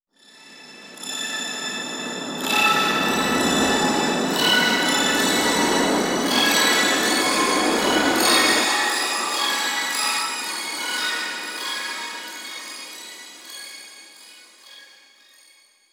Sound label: winter breeze